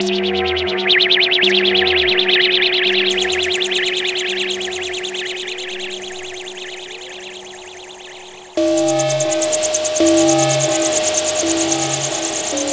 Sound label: Psychic Wave; Sonic Foundry (Sony Creative Software); Loop Noir; Session 08 - Psychic Investigator